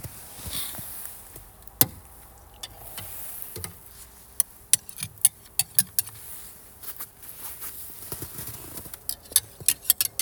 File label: CLICKIN